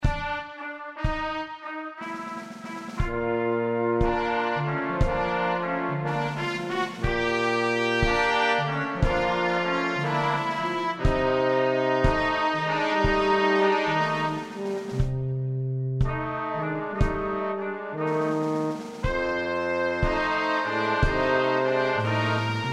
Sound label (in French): orchestre